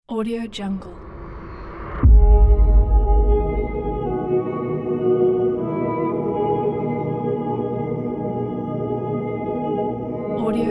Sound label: suspense trailer